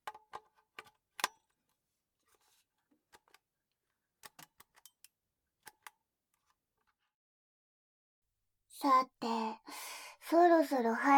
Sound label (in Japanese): 耳舐め ASMR 配信; 運営許可なし; メスガキわからせ配信; 人気動画配信者の正体は、俺をバカにする生意気な義妹でした